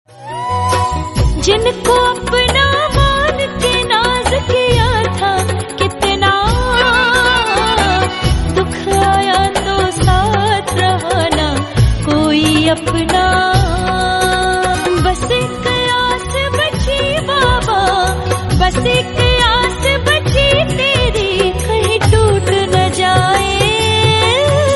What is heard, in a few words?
Khatu Shyam Bhajan Ringtone